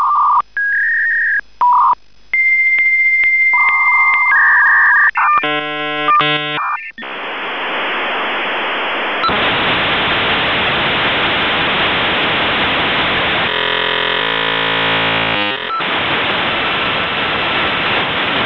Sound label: v90, modem